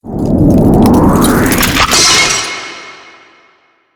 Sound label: shatter